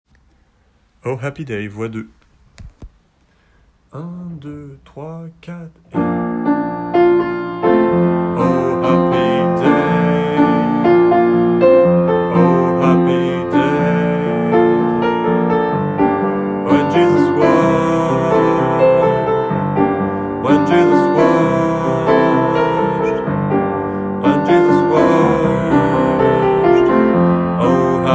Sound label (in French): voix